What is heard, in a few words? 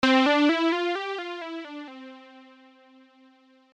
legato